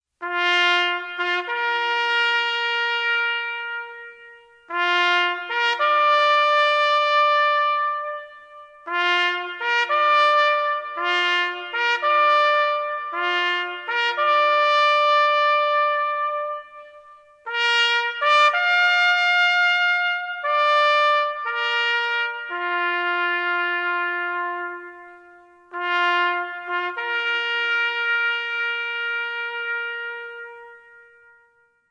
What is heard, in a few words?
Taps